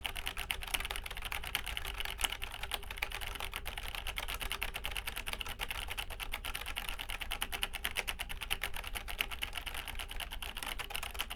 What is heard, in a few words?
AKG Lyra Y3 keyboard